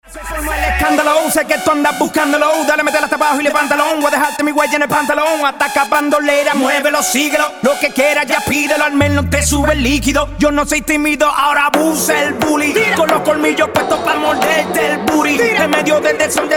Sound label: Transition 88-142